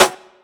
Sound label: prominent acoustic snare sound F sharp key